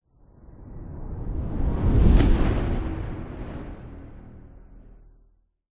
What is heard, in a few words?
sound design cinematic whoosh into slam impact dark